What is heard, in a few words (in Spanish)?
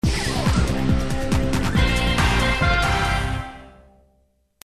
promo mezcla; PSN Network Logo TV; Rock